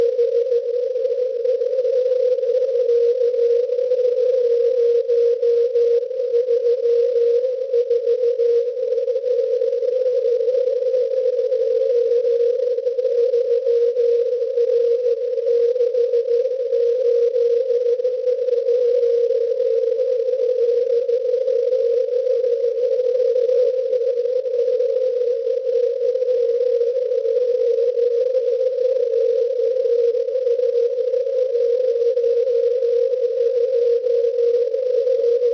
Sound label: cw; MIB40; 7022.89